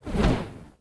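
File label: Attack; incubers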